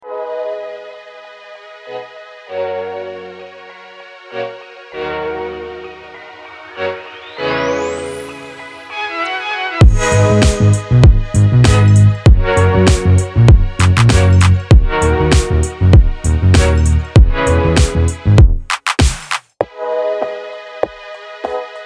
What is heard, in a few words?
Retro Vibez 98bpm